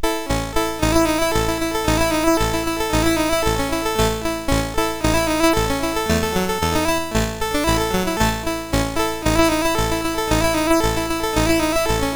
Shin Kicking Music, CSG8580R5

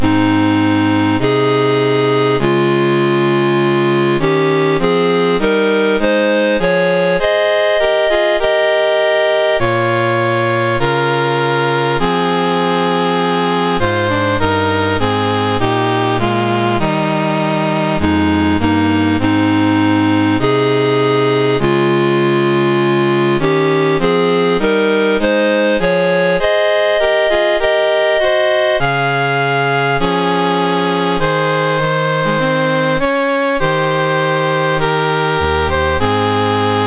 MOS6581R3, National Anthems; Russia

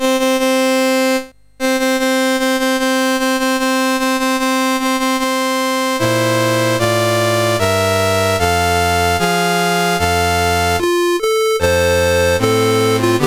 CSG8580R5, National Anthems; Guatemala

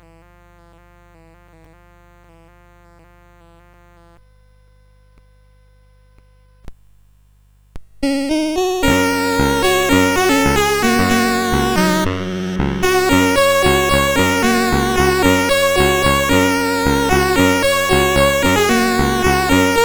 CSG8580R5; Melody

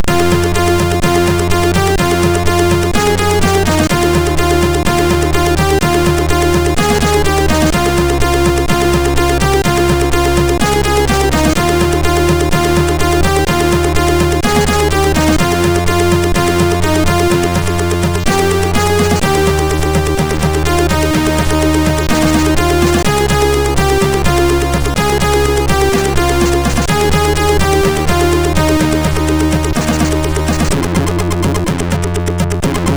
Intro, MOS6581R2; Falcon Intro 03 v1

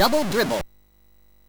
sid, MOS6581R3; Double Dribble

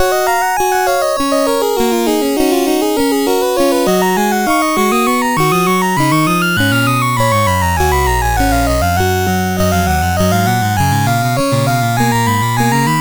Keyboard Piece, CSG8580R5

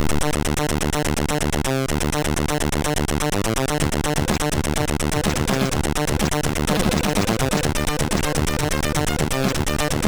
Intro Music, MOS6581R2; XS Intro Music

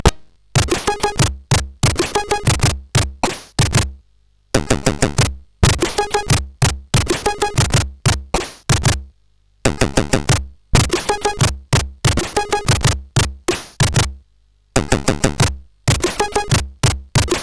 Birthday Sound, CSG8580R5

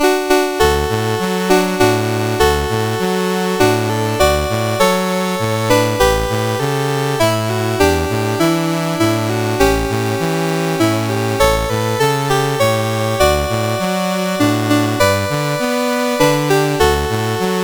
Brahms Lullaby, CSG8580R5

